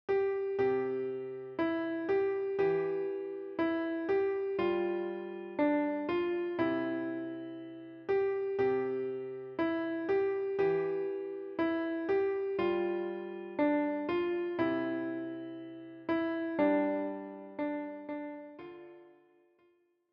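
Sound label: piano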